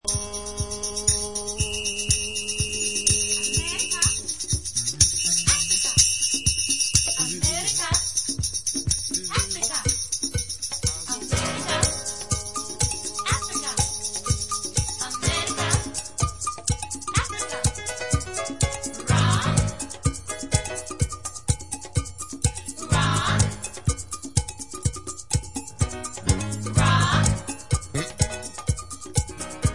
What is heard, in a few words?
African Rock Licky